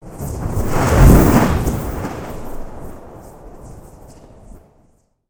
veter dul skvoz svistyashij; film i zvukovie effekti

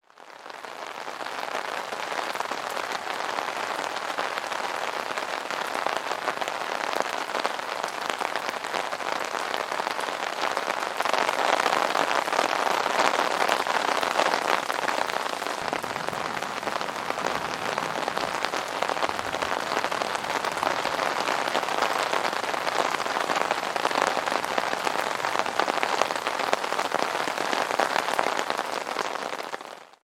dozhd i dozhd protiv zvuka zontika

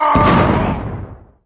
krik

